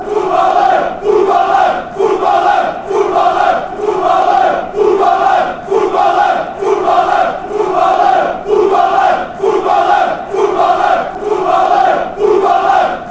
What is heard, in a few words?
football fanchants